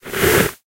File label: crate shift